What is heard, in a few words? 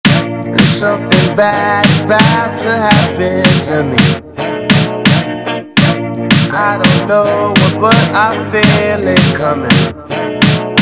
FUNK Slowed